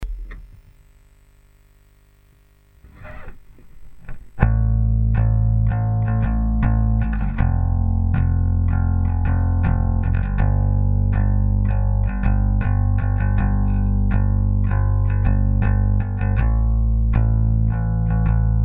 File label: Backing Track; chord progressions mastery